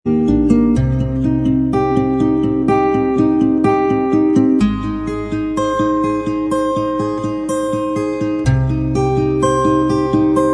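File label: acoustic instrumental track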